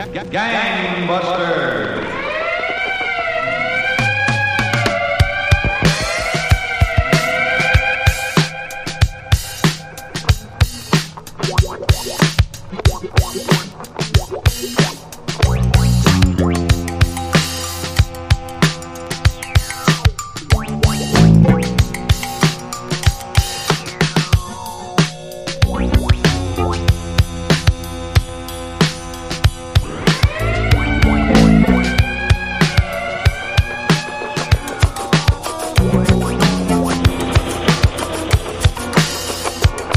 instrumental beats

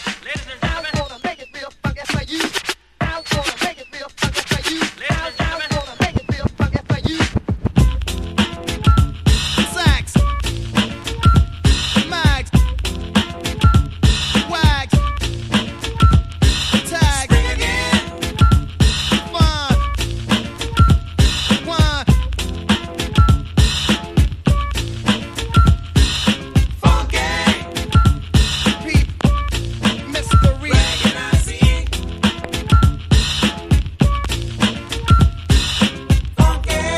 REMIX INSTRUMENTAL, hip hop rb

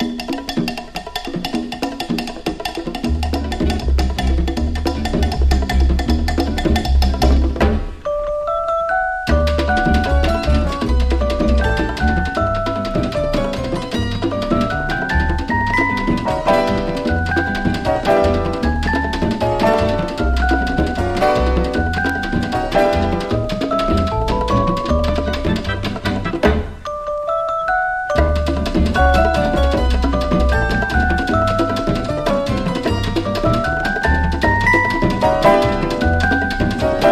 CARNIVAL IN PERCUSSION

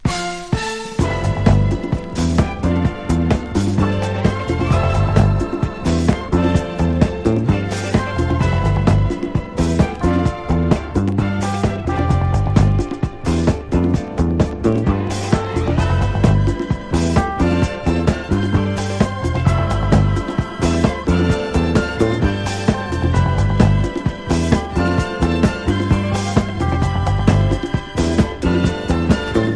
INSTRUMENTAL; SOUL TRAIN

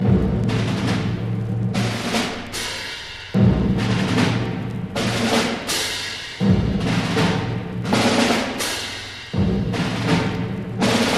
wild stereo drums; SAMPLING SOURCE

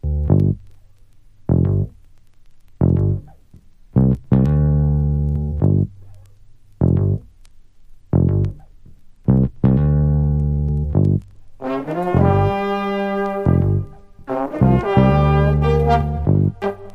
MOVING ON NO DRUM PASS

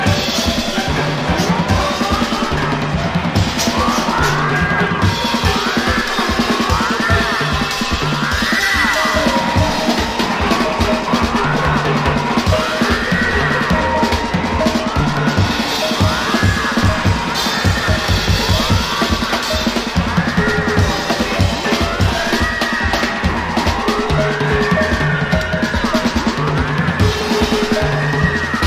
psychedelic percussion

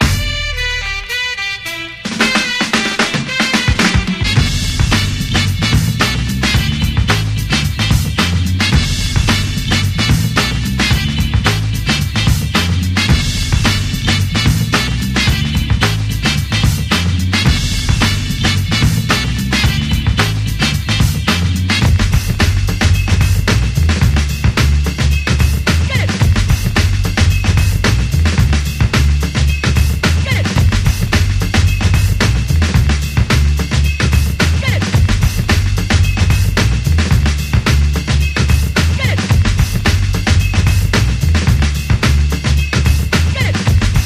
HIP HOP BAND INSTRUMENTAL; US; COLD CHILLIN'; REPRISE

